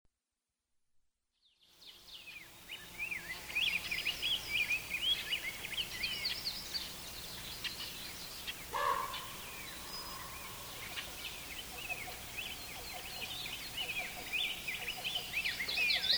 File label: birds loop